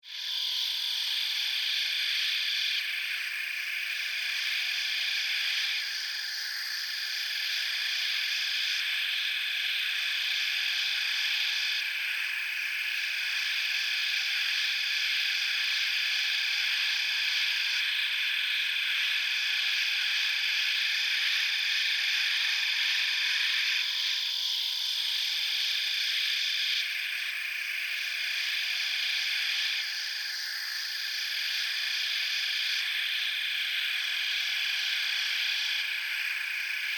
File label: Alien Jungle Insects, Scifi, Alien, Jungle, Insects, Creatures, Science, Fiction